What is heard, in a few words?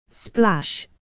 splash